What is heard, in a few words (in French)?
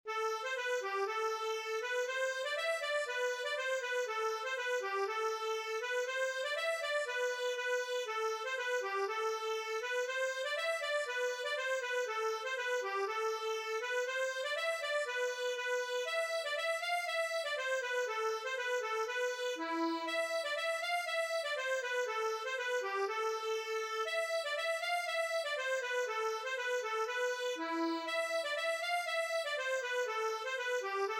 Accordeon; Le long du Chemin